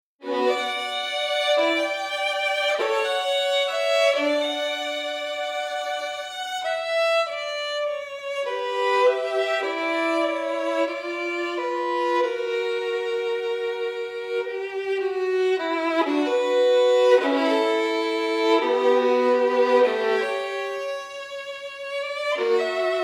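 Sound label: violin double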